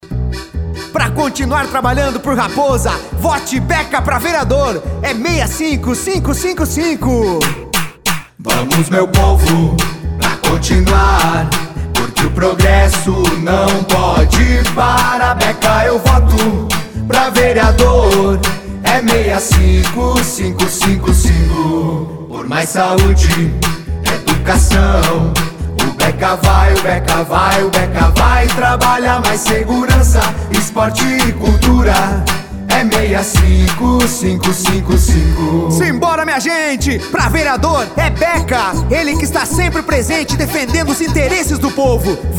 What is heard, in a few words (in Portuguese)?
VEREADOR; BeKa 65555; Raposa